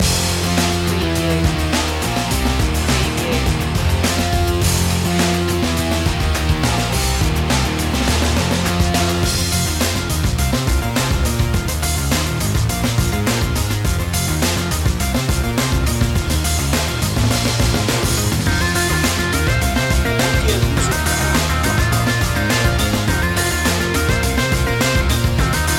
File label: rock slam